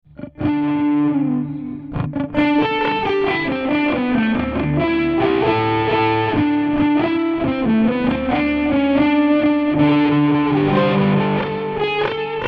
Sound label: Gothic guitar